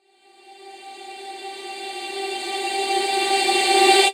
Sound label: Reverse Vocals G; Vengeance - Essential Clubsounds Vol.4; Effects